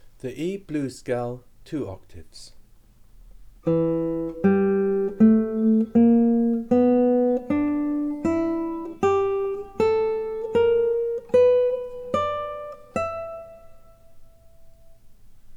E Blues Scale

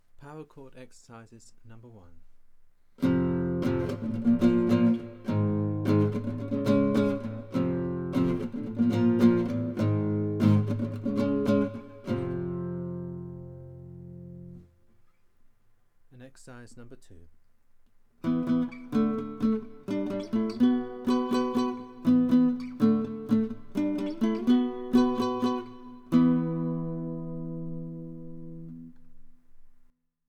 Power Chord Exercises, guitar

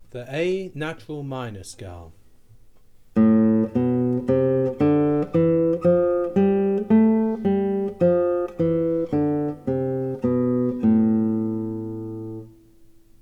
A Natural Minor Scale

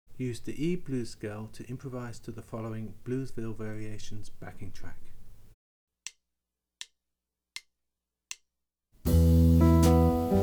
Blues Backing

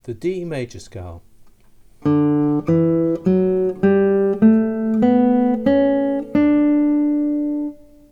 D Major Scale

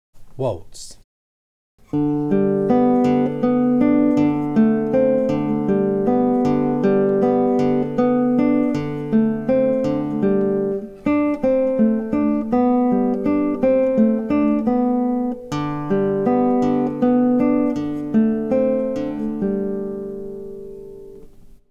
Waltz

